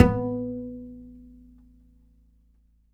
G, Upright Acoustic Bass; Larry Seyer; SWITCHABLE POSITIONS

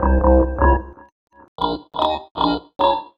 Lead Funk, Drum & Bass Keys; Sonic Foundry (Sony Creative Software); Knutrix Drum & Bass; Keys Pads 1

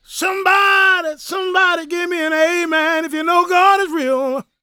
GOSPEL MALE; Spectrasonics Vocal Planet

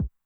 BD Med Tune Short Decay, 909mod, BASS DRUM; classic machines

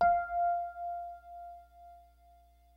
DYNO RHODES, E-PIANOS; Kid Nepro - Elite

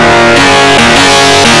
synth seq; Big Beat Samples & More; AKAI; ESO SEQS KIT

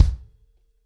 ACOUSTIC DRUMS, 90 BPM

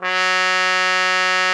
cup F#3, cup mute; Xsample VOL-3

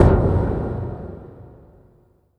IMPLODE, PERC; NorthStar - The Wizard VOL-2; TSAR PERC

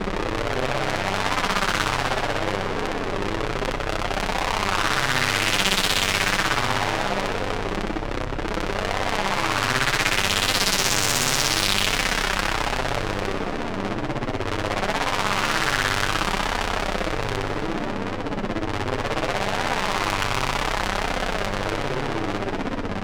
Atmos C1, Club Techno; Zero-G

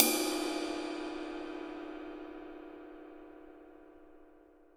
20 RIDE, CYM; Greytsounds VOL-1; SDX HATS+CYM

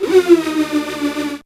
synth d, Rave Synths; Dance Synths

